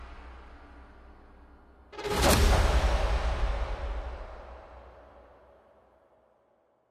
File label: transition; dbz